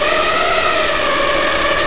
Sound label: tubecritter buzz